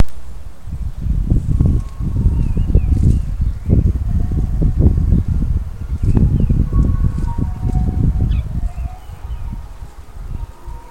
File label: South County Dublin soundscape